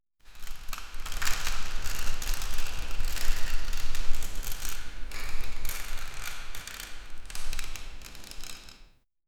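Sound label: very quiet theatre rigging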